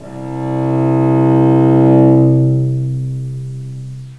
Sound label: C string arco, cello